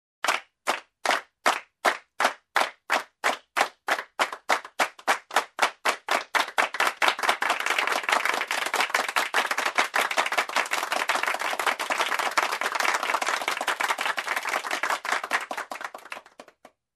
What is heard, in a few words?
Rhythmic Clap